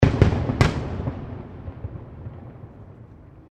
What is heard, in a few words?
Fireworks Multiple Explosions; Fireworks (Sound Effects:Backgrounds); Crafted Signals